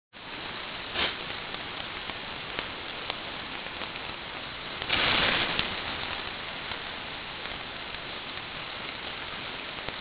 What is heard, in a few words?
BBQ Grill fire